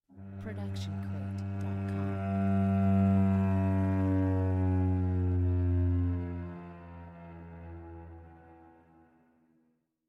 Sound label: Cello Bends Gliss; SoundsCrate